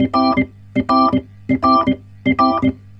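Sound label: organ, B-3, 90 bpm, roots reggae; Sounds Good - Roots Reggae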